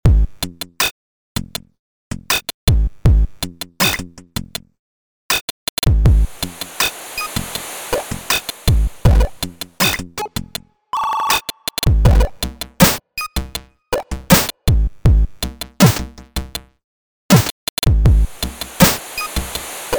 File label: Computer Drums; Multiton Bits